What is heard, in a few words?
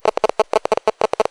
cell noise; Devices of Anomaly Redone; catspaw